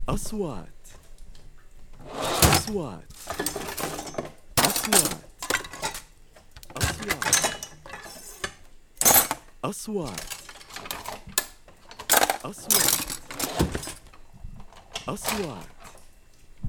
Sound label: kitchen drawer wheel